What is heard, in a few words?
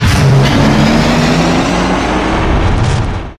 tank charge; targetank